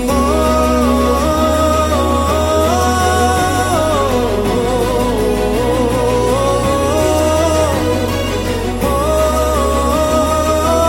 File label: BG Humming Tone